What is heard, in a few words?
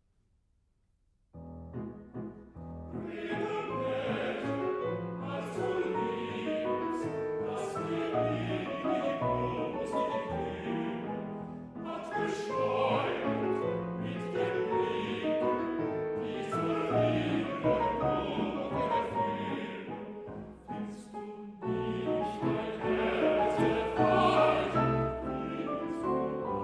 Waltz; brahms; liebeslieder